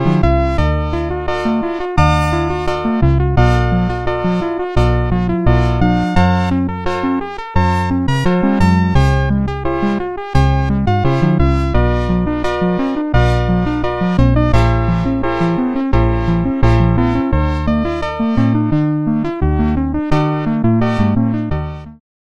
key electric piano

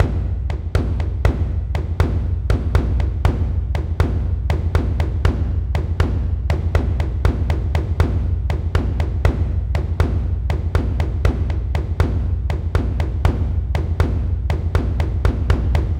taiko loop 120 bpm

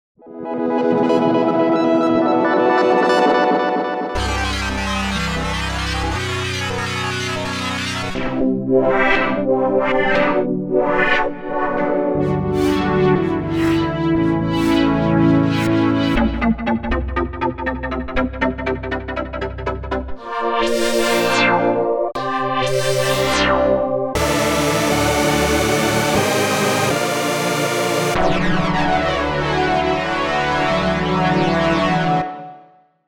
synth; wiggle presets

